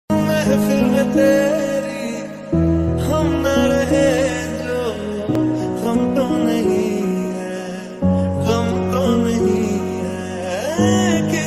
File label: ringtone song love ringtone new hindi ringtone music